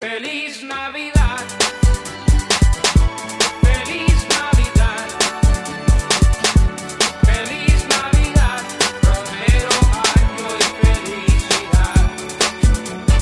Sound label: Song, ringtone; christmas